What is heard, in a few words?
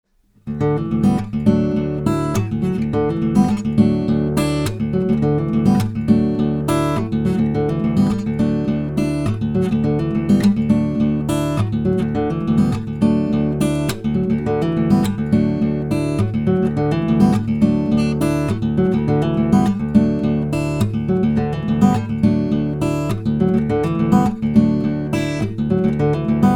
akg c2000